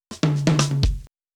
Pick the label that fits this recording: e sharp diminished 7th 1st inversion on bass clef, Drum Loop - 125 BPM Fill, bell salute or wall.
Drum Loop - 125 BPM Fill